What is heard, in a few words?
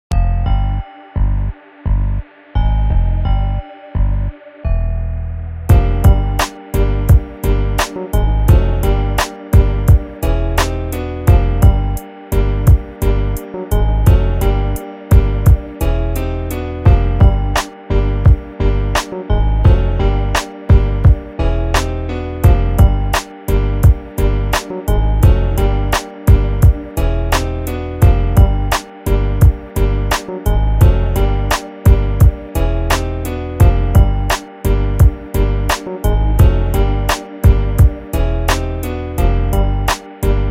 instrumental